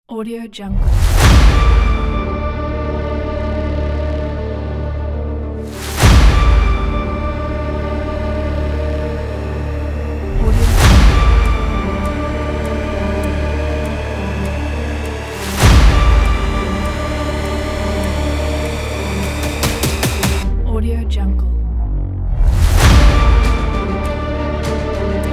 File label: cinematic hybrid trailer